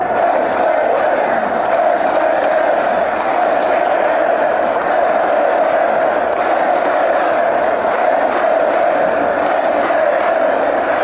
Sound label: czech republic chant